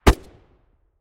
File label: fiveseven silencer mono far, weapons